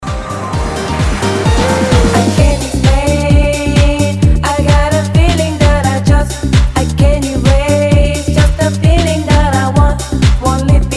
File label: deep house